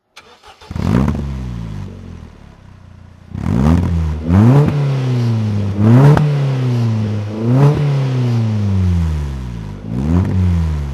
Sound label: caterham Super7 throttle